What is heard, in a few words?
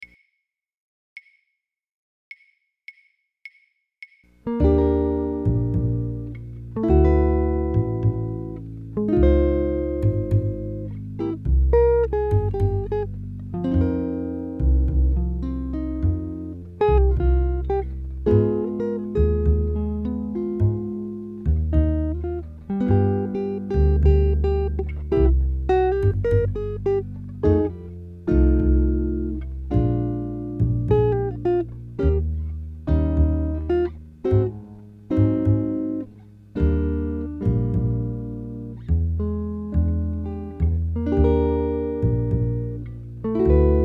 chord melody